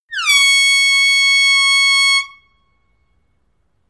Air Horn Blows No Cro, football air horn

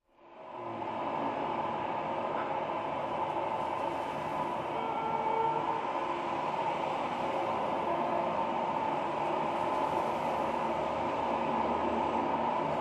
Traffic City Noise

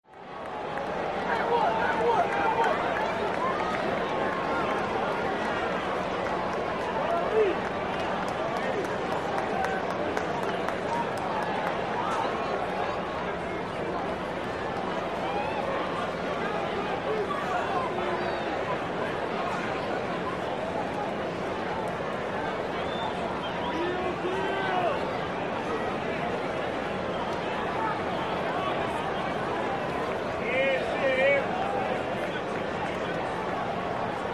Arena Crowd Act Idle, Crowd, Close Pov Steady

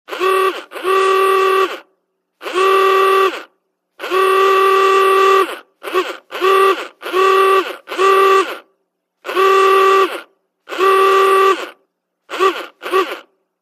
Antique Car Horn; antique horns

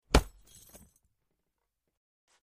Chain Metal Hits

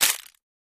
Plastic Crush Shrt

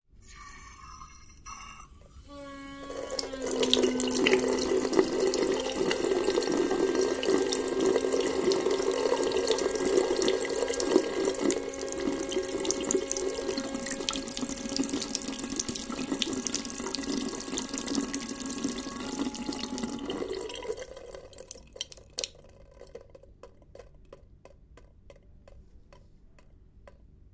Wash Basin Porcelain Singing Pipes

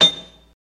Anvil Hit Hammer

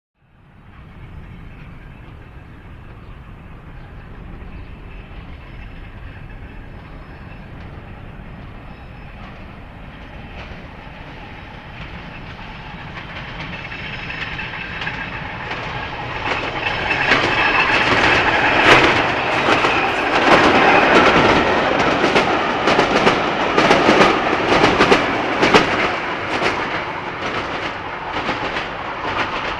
Electric Train Fast Past And Away

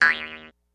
Jews Harp Shrt Vibr, boings

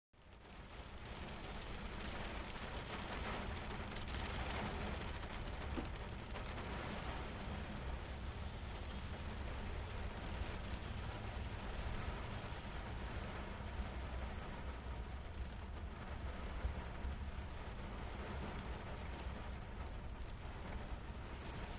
Rain - Heavy, On Plants

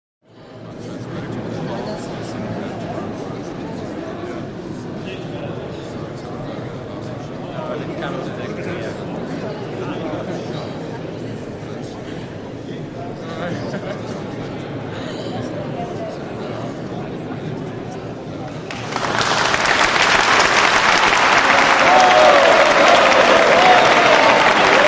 Int Large Crowd Walla; medium

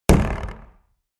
Large Plastic Tank Hi

